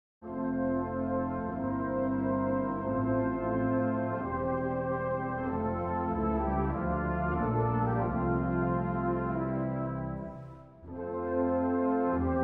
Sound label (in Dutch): plechtige stoet